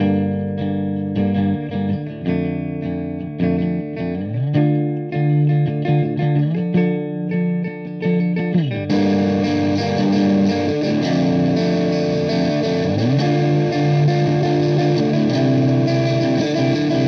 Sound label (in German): Verzerrer Pedale High Gain; Plumes